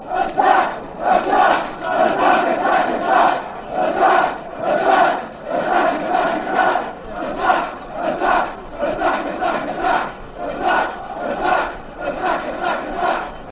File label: attack attack, fan chants